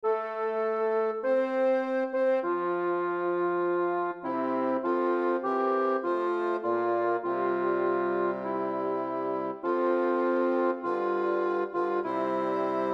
Alto, choir practice; O Canada; chalice choir

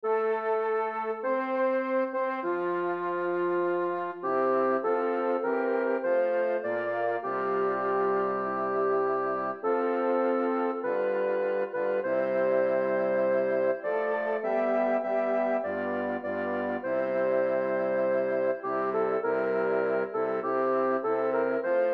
Soprano, choir practice; O Canada; chalice choir

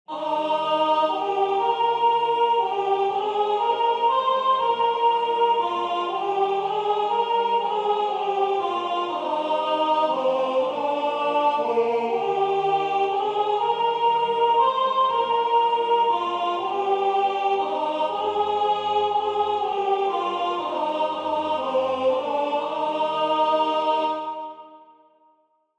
Soprano with Full Choir, choir practice files; chalice choir

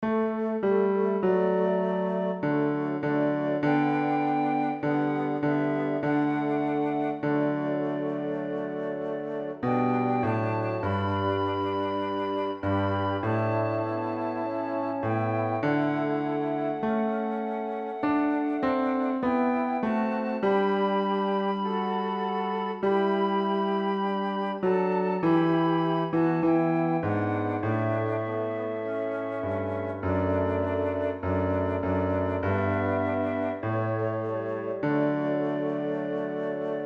Blessings of Love Bass

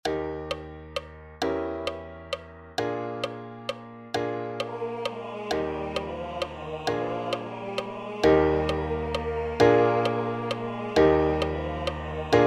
SOPRANO, choir practice; chalice choir

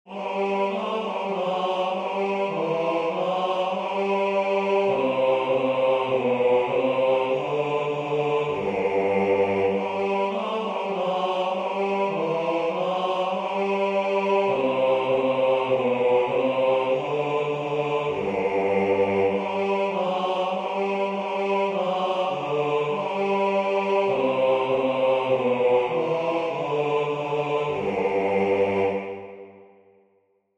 Bass and Full Choir; For the Beauty of the Earth; chalice choir practice files

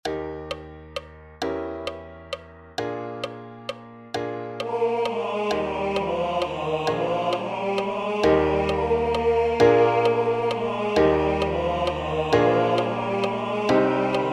TENOR, choir practice files; chalice choir

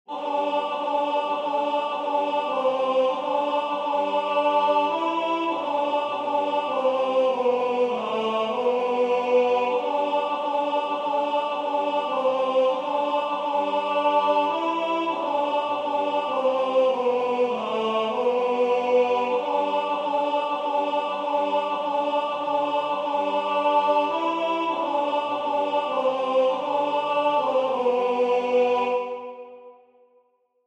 Alto and Full Choir; For the Beauty of the Earth; chalice choir practice files

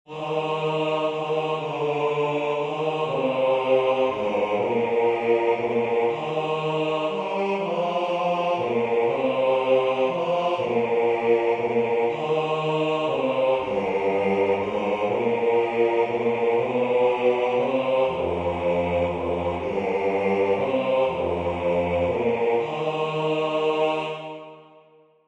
Bass with Full Choir; chalice choir practice files

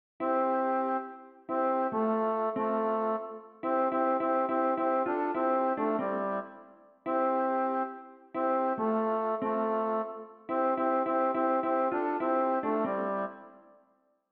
Harmony, choir; chalice choir

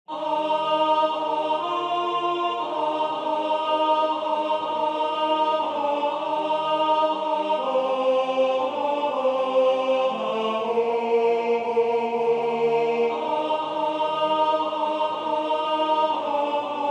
Alto with Full Choir, choir practice files; chalice choir

